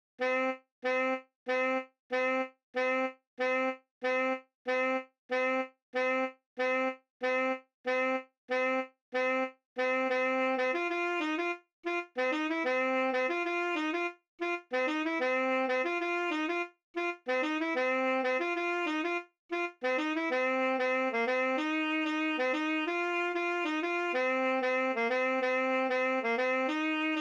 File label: Saxophone 2; OSS 117